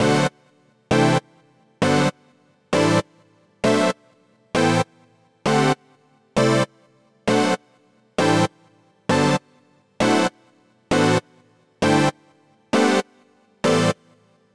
Polystacks; super crush k.o.

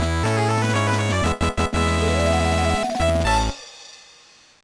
Stage Clear; Yoshi Topsy-Turvy

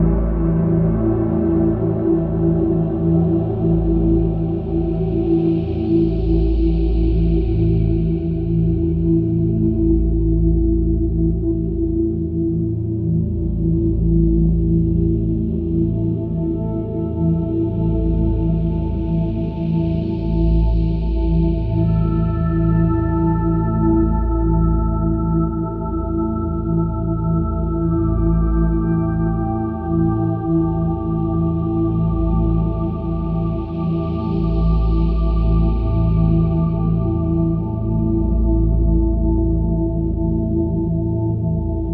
Terror Loop; Void Bastards

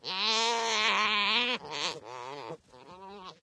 Llama Kid Panic

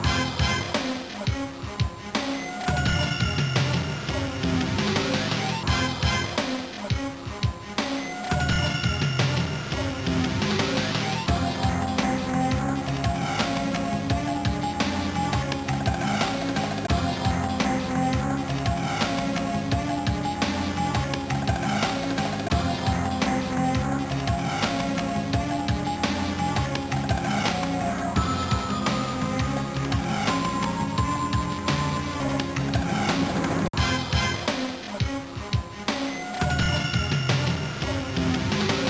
Mission Complete; 007 Nightfire; GBA

